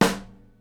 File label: SNARE, Live Snares